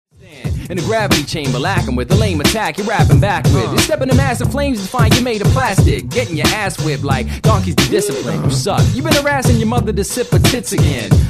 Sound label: battle rhyme; hookers